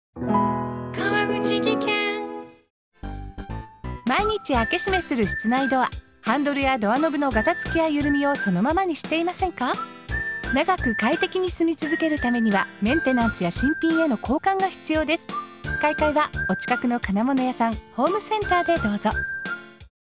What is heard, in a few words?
radio lever; kaikae type20s